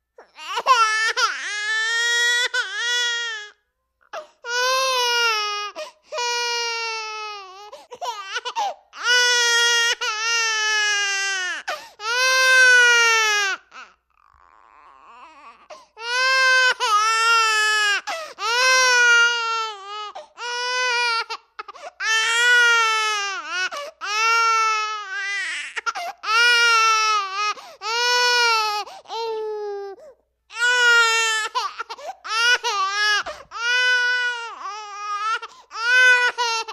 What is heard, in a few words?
Baby: 10-month-old, Crying. Interior.; Mono